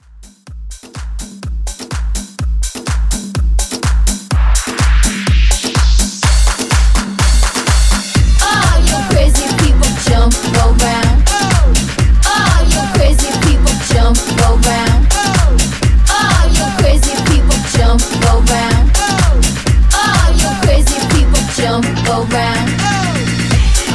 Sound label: 90s Hype Redrum 125